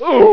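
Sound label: player pain; abuse-0.31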